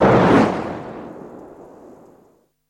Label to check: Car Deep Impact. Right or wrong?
Right